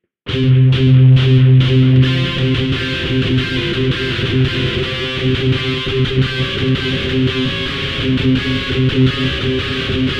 guitar Lick

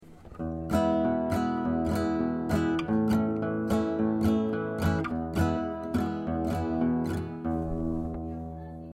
Cowboy Strumming, guitar chords; Mexican; Mexican Ranchera song typically referred to as Mariachi

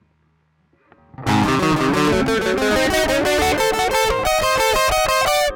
Maj Dim, licks

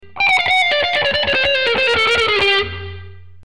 Chromatic Blue, guitar

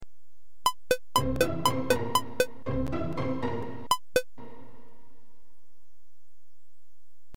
Quaver Example, guitar; 2/8

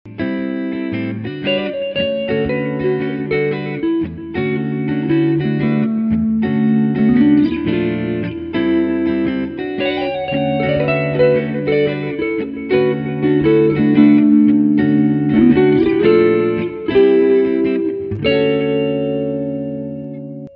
D MAJ7, guitar

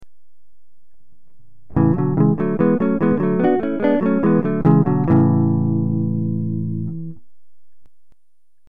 Ion phryg mix

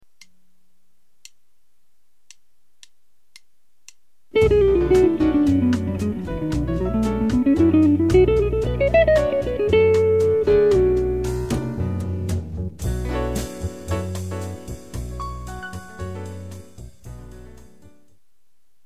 Smooth Chromatic Lick, guitar

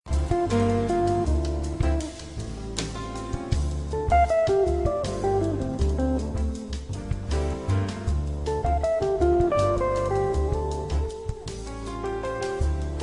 Upper Triad, guitar

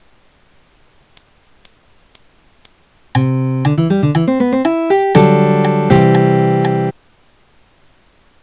C Maj7 Soft, guitar

